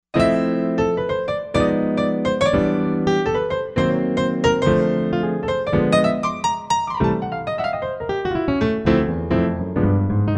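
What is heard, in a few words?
Piano Jazz Grand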